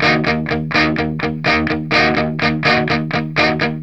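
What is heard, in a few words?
rhythm 125, Vintage Blues Guitar; USB Soundscan vol.22; AKAI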